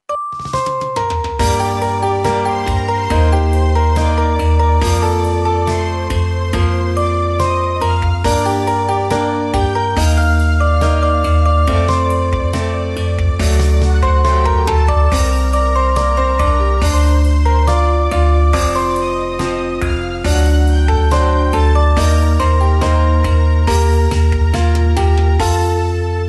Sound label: Oppo Because Ringtone